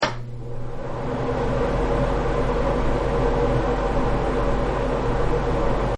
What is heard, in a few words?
Fan Turn On; Fans; Electronics; Partners In Rhyme